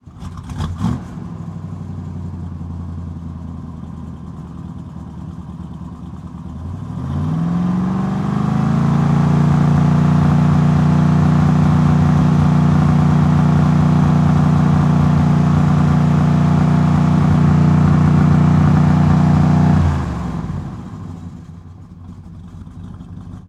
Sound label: 68 Road Runner Fast Idle, Automobiles